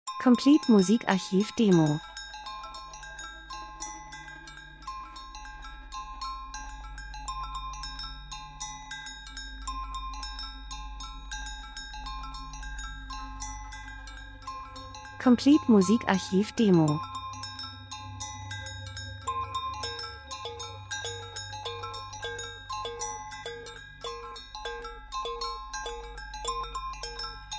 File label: drums; Schellen Rhythmus Percussion Asien Nepal Tibet Menschen Zeitgeschehen Land und Leute Reisefilm Dokumentation